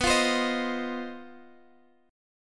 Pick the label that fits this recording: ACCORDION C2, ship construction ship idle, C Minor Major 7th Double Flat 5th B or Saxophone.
C Minor Major 7th Double Flat 5th B